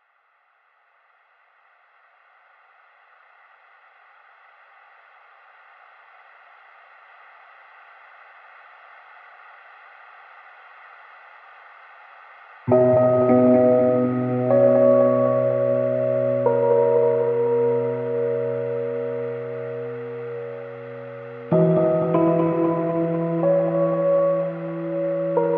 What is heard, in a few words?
Keys Only